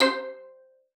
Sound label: C5, VIOLIN SPICC; Xsample VOL-5